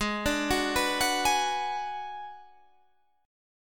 B Minor 6th Ab

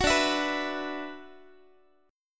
D 6th Add 9th D, Guitar; D6add9 strummed; 21,22,19,21,x,x; tuning 4A,4E,5A,5D,5G#,6C#

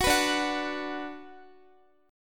D 6th D down Guitar, chord

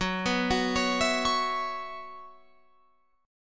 Gb 7th Suspended 4th, Guitar Open F; Gb7sus4 arpeggiated

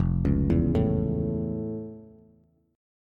F Augmented Major 7th F Bass

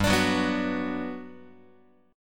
G Major 7th, G, down, Guitar; strummed; JGuitar

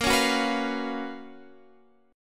Gb Major 11th Bb, chord

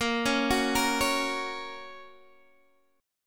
A sharp Minor Sharp 5th, Guitar, DADGAD

